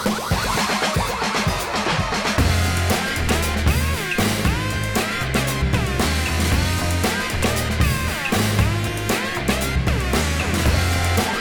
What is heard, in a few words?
Huawei Bongo Ringtone